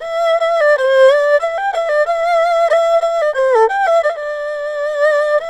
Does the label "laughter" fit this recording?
No